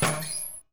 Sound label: Trash